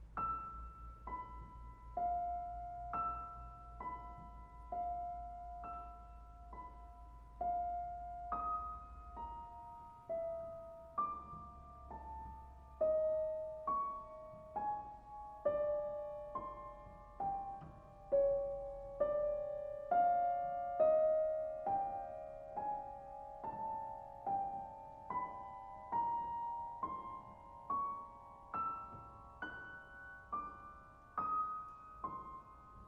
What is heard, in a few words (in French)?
Eveil Cristal